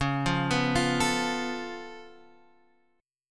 C sharp Augmented 7th C sharp Guitar Half Step Down